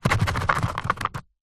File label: office business cards in card box shake